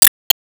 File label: Enemy Bigstamper Fall; disney star smash android ios mobile gamerip 2020